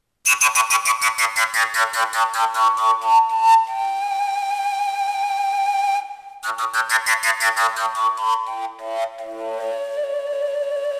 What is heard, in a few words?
a 2parts natural, fujara; folkart slovakia